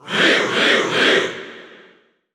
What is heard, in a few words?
Cheer English; Ryu; SSBU